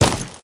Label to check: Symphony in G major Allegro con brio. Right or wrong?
Wrong